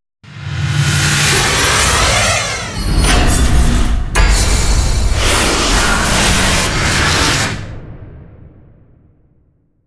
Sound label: Storms Stinger; Gothic Storm Music; Advanced Sound Design 2012